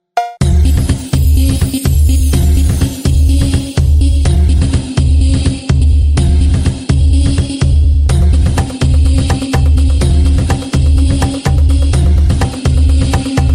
dance ringtone

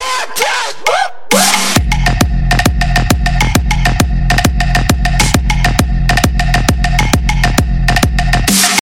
dance english ringtone

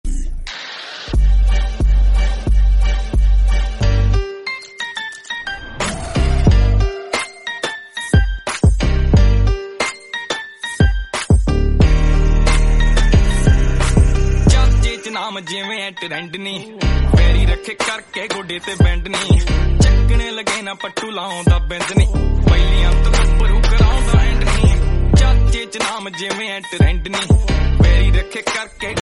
punjabi dance song tone

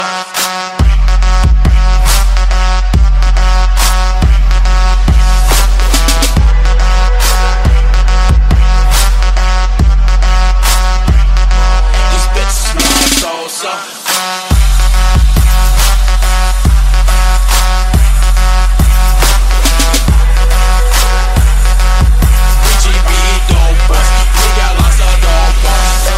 gangsta beat ringtone

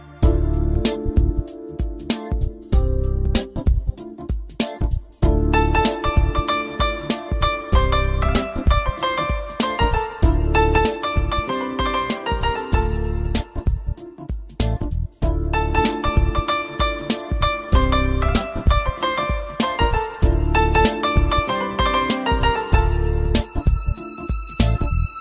cool ringtone

